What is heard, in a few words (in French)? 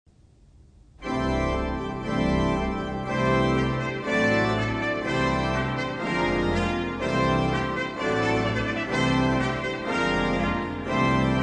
Trompette